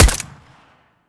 usp, firesound

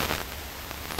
static light; cstrike; D26 slender

